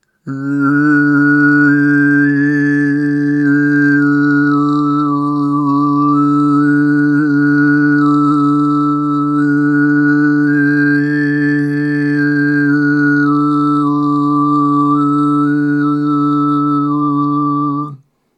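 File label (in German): Hörtest Tonbeispiel Obertongesang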